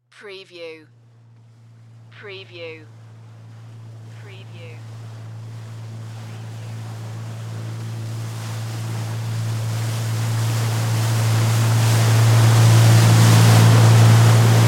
Jet Ski Pass By Slow; Sound Effects Bible

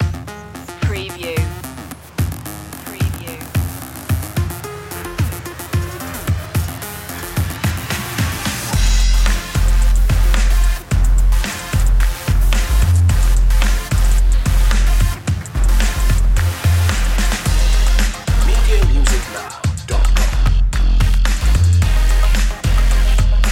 big jump

